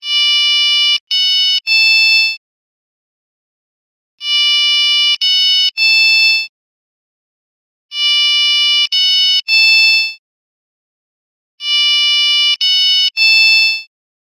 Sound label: Clock Alert Htc